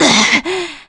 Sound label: Taunt Crazy; Toon Link; Link out of breath after the taunt; Zelda